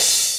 Cymbal G

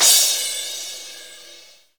soft finish

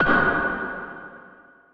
Drop (F#5)

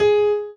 G#5

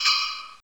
METAL HIT

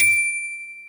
Bell D7

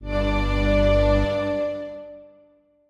Strings

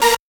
synth; osu

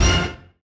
funny orchestra hit (f)

